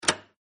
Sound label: ui Click; Legends of the Hidden Temple - Unlock the Past